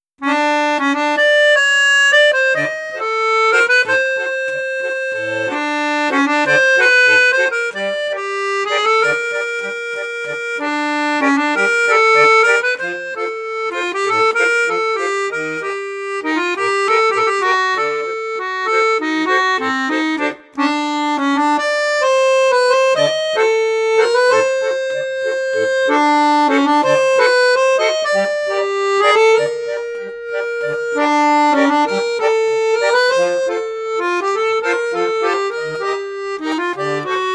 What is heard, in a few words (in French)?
registre basson, accordeon; crucianelli